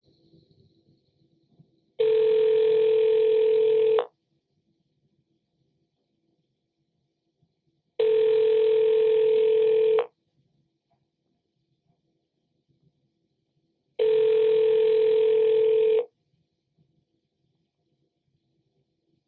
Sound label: phone dialing